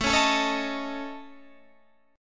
A Major Flat 5th A Guitar Standard, chord; AMb5; strummed; JGuitar

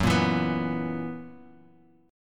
Gb 11th Gb down, chord; Gb11 strummed; JGuitar

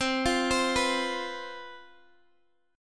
C Major 7th Suspended 4th, down, Guitar, DADGAD

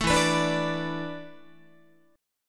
E 7th Suspended 4th, Guitar; E7sus4; strummed; 12,12,14,12,15,13

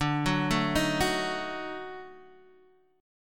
D sharp Minor Major 7th Flat 5th D

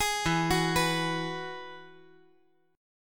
E Major Add 9th

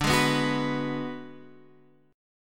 B Minor 6th D

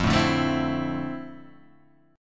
F Major 7th Add 6th, guitar chord; FM7add6 Chord {1 0 0 2 1 0} strummed

